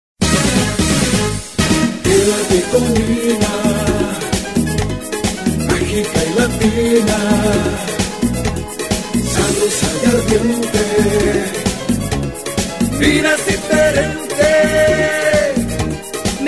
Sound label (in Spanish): jingle radio fm; NINA STGO